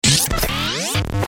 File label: Electro Static Accent